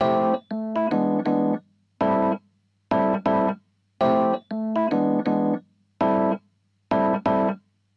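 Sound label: epiano, Hybrid neural guitar overdrive; Morphdrive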